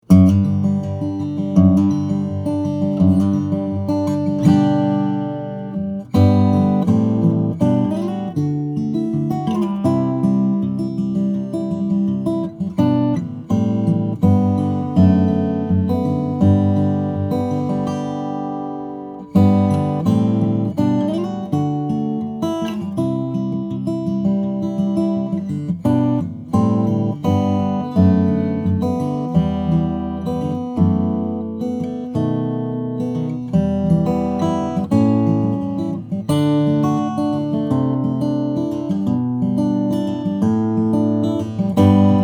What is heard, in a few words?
steel string guitars, flattop; brazilian rosewood; italian spruce; Wingert; Dream Series